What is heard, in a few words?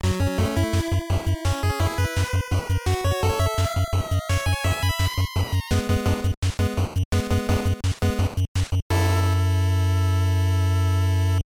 Game Over; High Speed